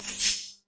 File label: swipe horizontal; transition